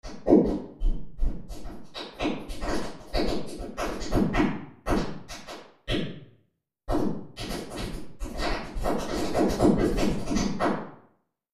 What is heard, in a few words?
Small room dark; .mp3 file - wet = dry - 26.7dB*; freeverb